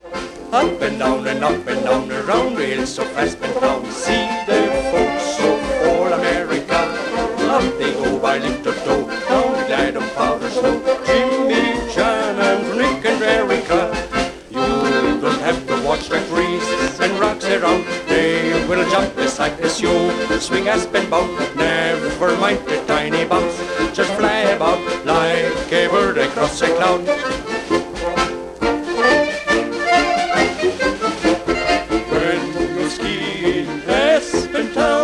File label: Polka